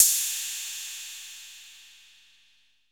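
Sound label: HH, TR-808 hi-hat; TR-808 & 909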